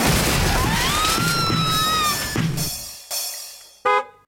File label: game Over; ReisenYoumuOuting